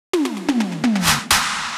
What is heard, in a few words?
tom fill 128bpm